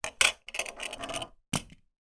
leisure toy plastic recorder down on table rool and fall to carpeted floor